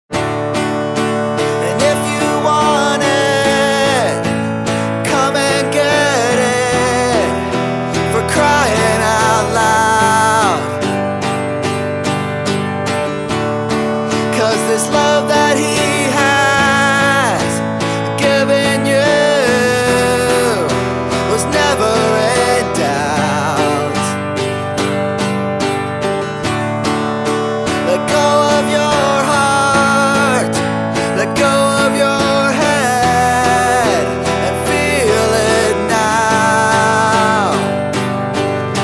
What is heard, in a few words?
soprano